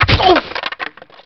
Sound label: pain